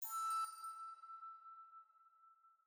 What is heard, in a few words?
Alchemy Flute Combo